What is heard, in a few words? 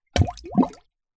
nature water bubbles metal hollow pole sink submerge plastic tub